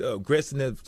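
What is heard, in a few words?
aggressive sniff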